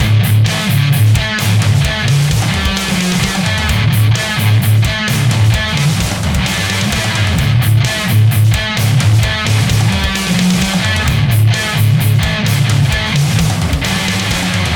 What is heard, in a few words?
Metal Rock Loop